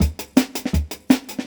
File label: Jungle Breaks